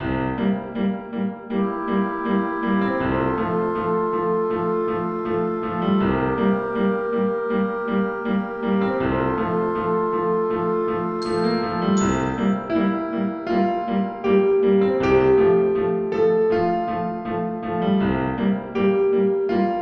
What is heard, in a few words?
minigame loop; Secrets of the Titanic; 3DS